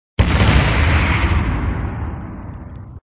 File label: Sound SFX, flipper